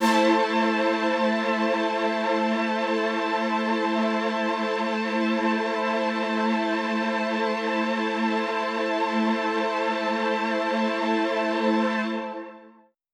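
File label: Poly String A minor, synth orchestra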